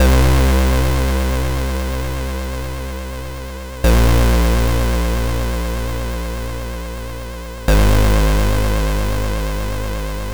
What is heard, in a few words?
c64 loader; ocean